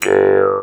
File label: MT G, JHARP G B; Xsample VOL-9